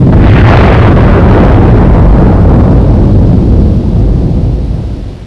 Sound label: Nuke Area Destroyed; Barrack 1.0.0; Barrack Sounds